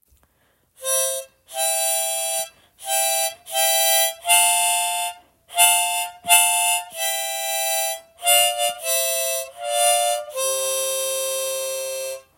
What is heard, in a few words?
Harmonica, Twinkle; voith usa